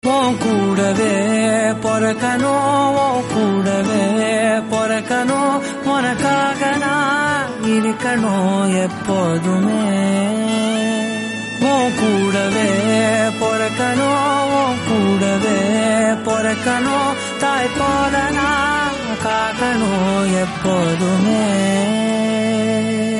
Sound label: tamil song; namma veettu pillai